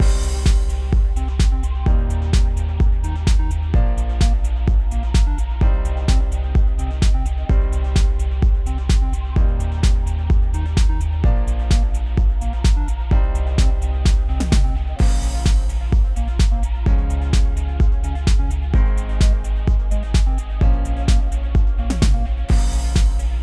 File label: Puzzle Tune, puzzle music